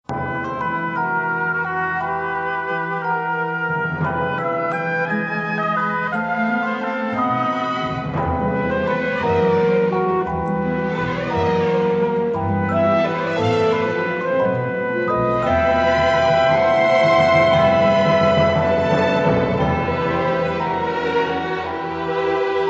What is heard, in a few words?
Mysterious Cool